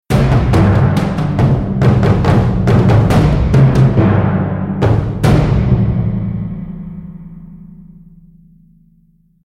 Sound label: arena drums, music